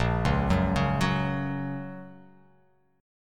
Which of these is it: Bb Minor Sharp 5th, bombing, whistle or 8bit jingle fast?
Bb Minor Sharp 5th